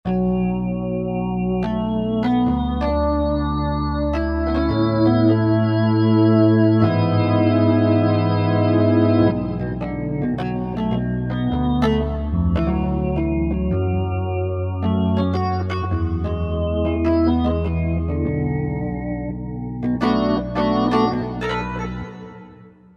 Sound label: Organ, Korg MSC-14S Sound Effects